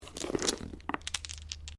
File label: Dice Small Glass Table Pickup